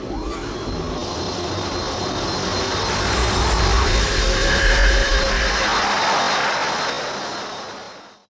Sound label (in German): DUESEN; Best Service; Advanced Mega Effects